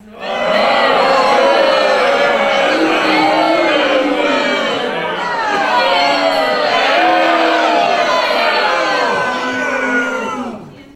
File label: Crowd Booing